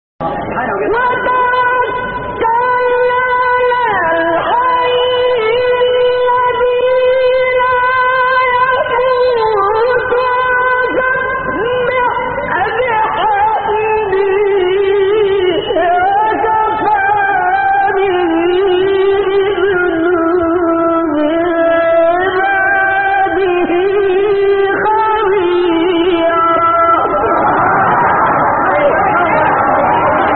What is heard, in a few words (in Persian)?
فراز شنیدنی آیه سوره; 58; فرقان; شعبان عبدالعزیز صیاد